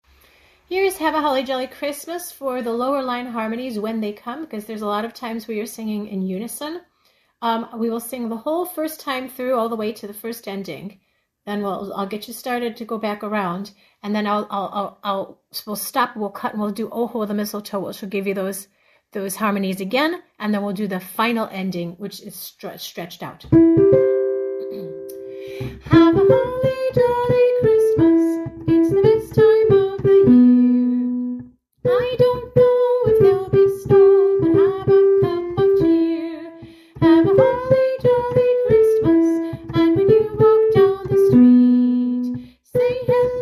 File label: lower harmonies, choir